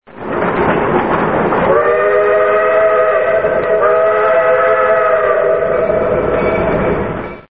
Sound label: shouting train